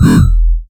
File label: Growl